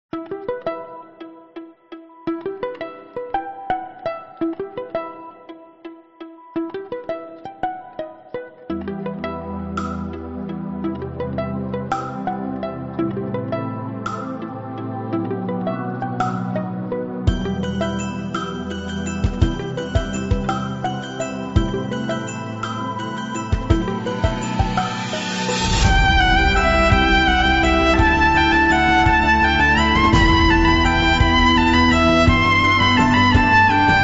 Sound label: Carol of the Bells, Violin COVER